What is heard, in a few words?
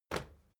step crowd march quiet single right; schritte